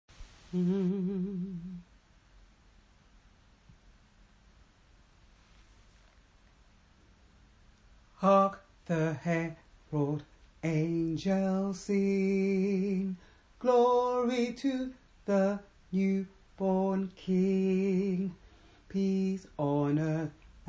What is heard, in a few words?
tenors, gospel choir; Hark The Herald Angels Sing